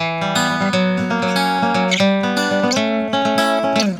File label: GUITAR SALSA